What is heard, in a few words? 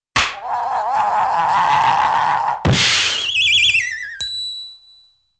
smack spin crash whirl and ding